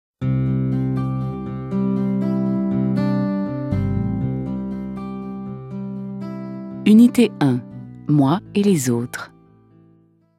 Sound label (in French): chanson je chante l'alphabet